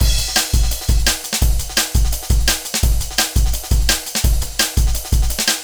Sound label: Jazz Break, Drum & Bass; Fluid Dynamics